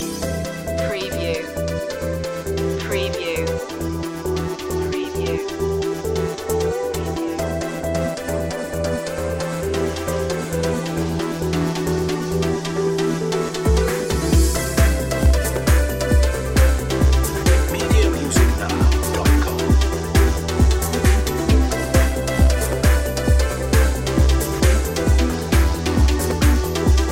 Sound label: ground pulsation loop